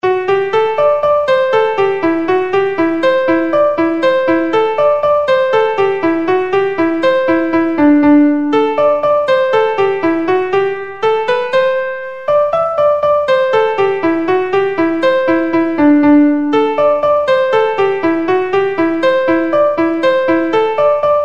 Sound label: Jenny Picking Cockles; Piano